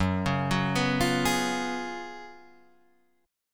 F sharp Major 7th Suspended 2nd Suspended 4th, down, Guitar, Drop D